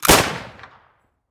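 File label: sudden shot during exercises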